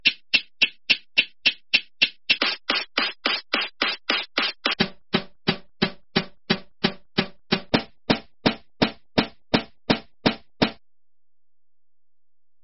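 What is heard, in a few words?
brushed snare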